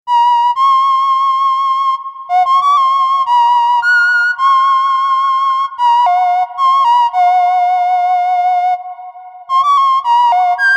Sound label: lead vco